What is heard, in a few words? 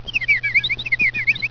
musical crickets